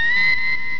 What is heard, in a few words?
med scan